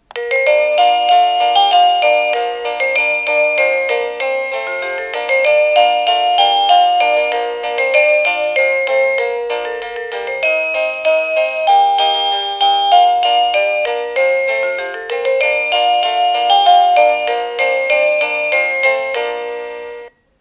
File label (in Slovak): Ukážka melódie zvonenia zvončeka